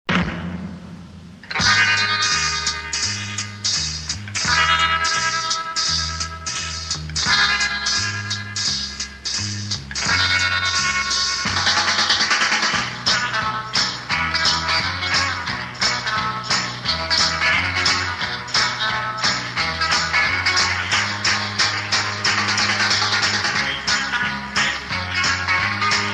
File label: backing track